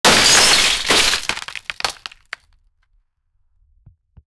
a loud dramatic cracking, log

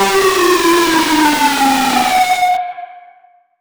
horror game jumpscare sound

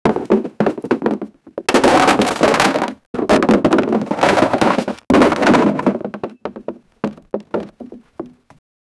wooden boxes fell with

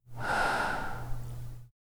suspense, sound-effects

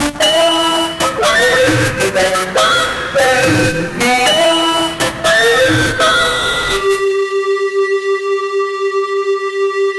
sneaky golem sound; clash royale

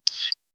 kiss sound; human interactions

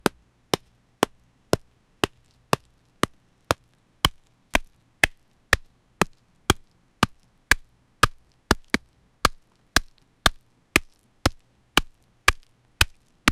the sound of meat hitting, cooking

